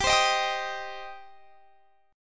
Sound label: G Suspended 4th Sharp 5th; Gsus4#5 strummed